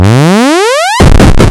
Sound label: Pushable Obstacle Destroy; backrooms 2d android ios online windows gamerip